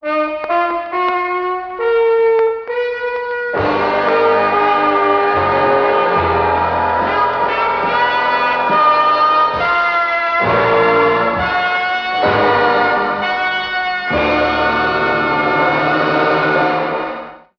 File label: horns triumphant; Loops